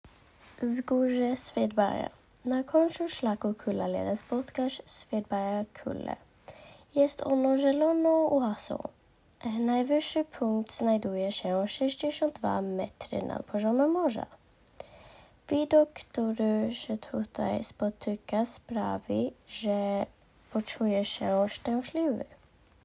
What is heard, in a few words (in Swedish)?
Svedberga kulle polska